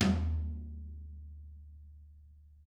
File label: HIT, Drums; DW14